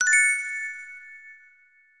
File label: Bonus; deep underground